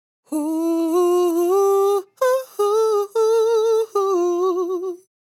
Ad Lib Dry, 95 BPM F Maj, Vocal; Cymatics; Euphoria Vocal Sample Pack; Ad Libs

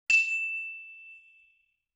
LoFi Metal Chime Hit, Vintage Cartoon

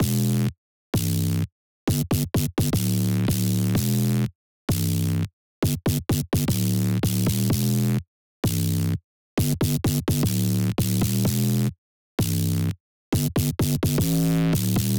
128 BPM E Min Bass; House; Drop Loops; Cymatics; Goliath

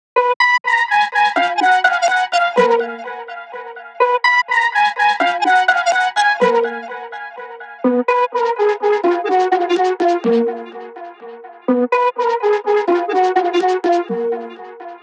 125 BPM Flute, D# Min; Melody Loops; Loop Stems; Cymatics; Fever Dream

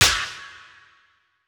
Snare, Dubstep Snares; GhostHack

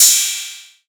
Ride, Techno Drum One-Shots; Ghosthack - Free Techno Drum Shots 2024

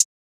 Closed Hihat Intimate; Drum One Shots

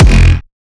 kick oneshot lilmonster F; GhostHack

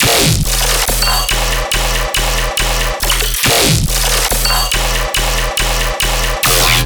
Bass Loop E 140BPM, Dubstep

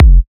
Kick Overrun; Drums & Percs

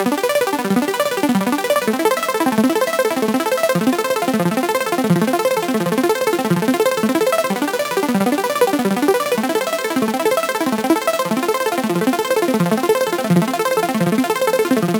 Arp Loop 128 BPM C maj, EDM, Synths; Loops; Cymatics; EDM Starter Pack

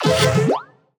Retro FX; Cymatics; Video Game Sample Pack Vol 1

